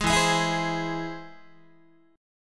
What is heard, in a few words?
E Augmented, Guitar; E+ strummed; JGuitar